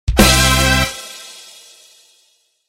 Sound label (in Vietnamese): tieng trong joke